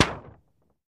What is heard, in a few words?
Target Hit Light Pap, target range